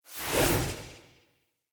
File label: Staff Caster Cast Long; Weapons; Staves; Starter Staff Caster